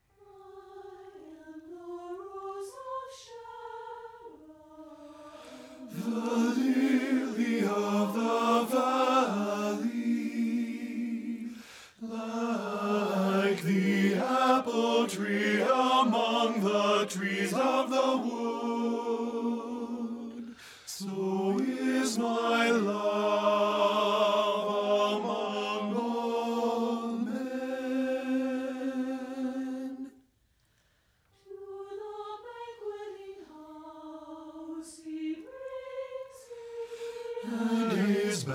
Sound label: THE ROSE OF SHARON BASS